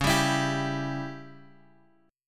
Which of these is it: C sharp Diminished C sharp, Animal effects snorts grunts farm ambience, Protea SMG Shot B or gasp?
C sharp Diminished C sharp